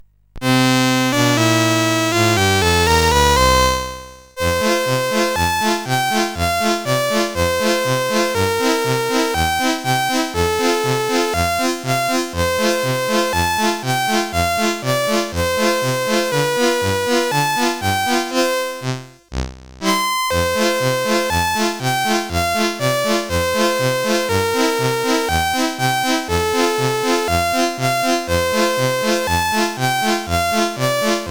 sid, CSG8580R5; Thunderer

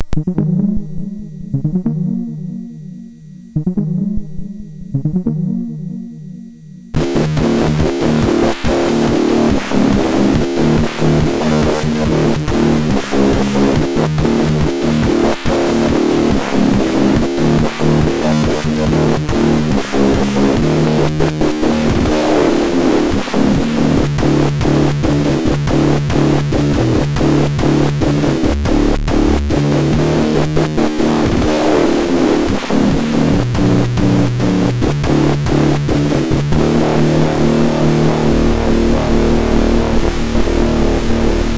Heavyweight sid MOS6581R2

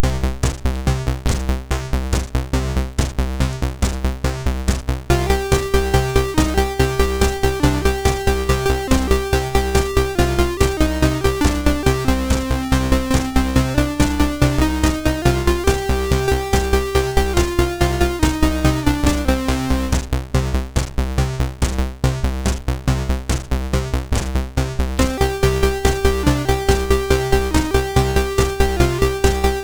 Fire Breath, MOS6581R3

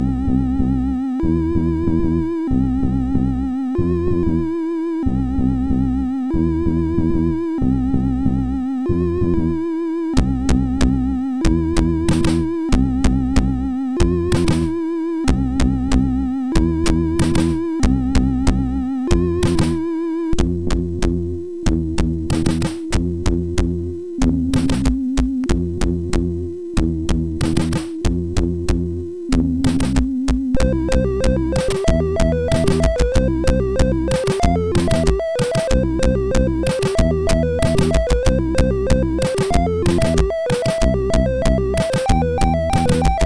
Morse, MOS6581R4

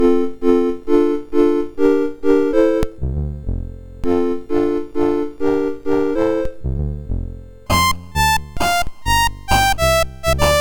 Harmony, MOS 6581 R2

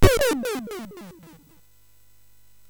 Fuzzball, MOS6581R3

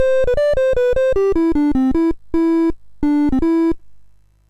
MOS6581R2, GAMES; Sammy Lightfoot

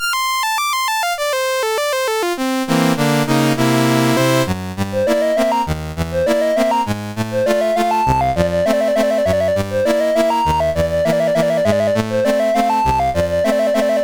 Rag, CSG8580R5; Dave Marquis

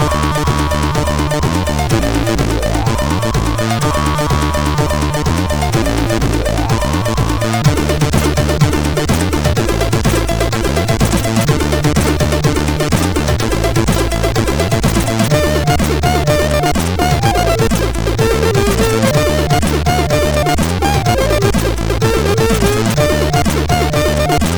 Intro, CSG8580R5; hvsc

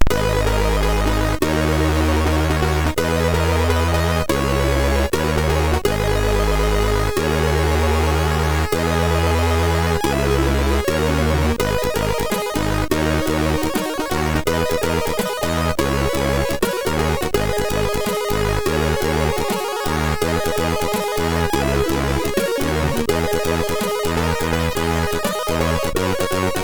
Disco, MOS6581; MOS6581R3; Disco Arm

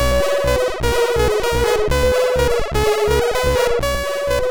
Happy, MOS6581R2

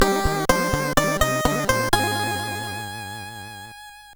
sid, CSG8580R5; Atic Atac C64

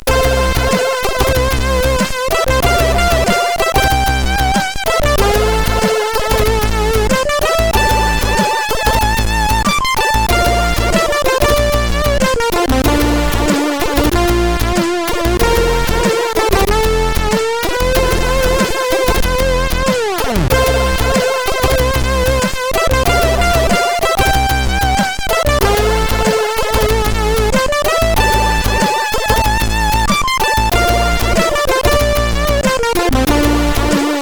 Drum em, CSG8580R5; hvsc

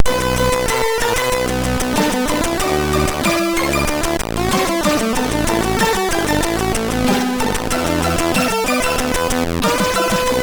Funky Sound, MOS6581R3; sid

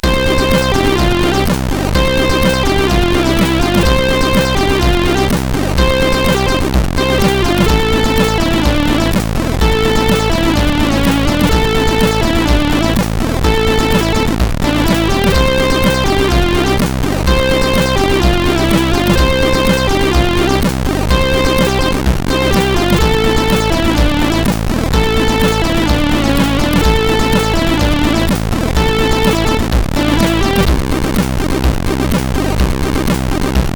sid 8580R5; Amok Title; hvsc

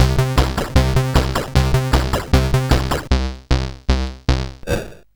Fire Breath, CSG8580R5

